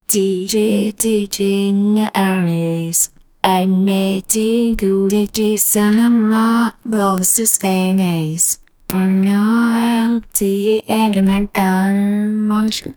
dj news, broadcast; vanilla

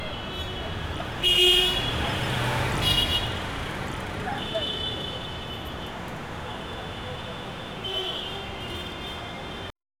late night quiet delhi st, night

